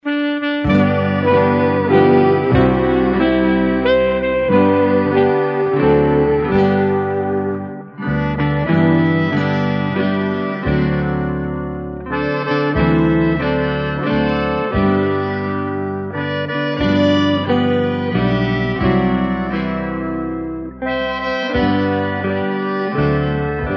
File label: traditional happy birthday karaoke